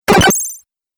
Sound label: 8 Bit Aggresive Laser Bleep; Video Game Sound Effects